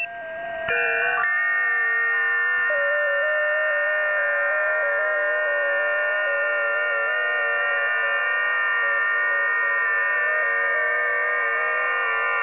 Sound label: websdr test, ft8